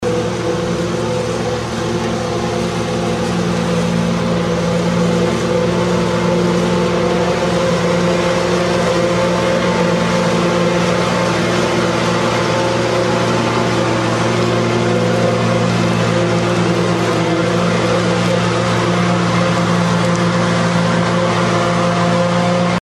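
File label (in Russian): kombain kosit kukuruzu, combine harvester mowing corn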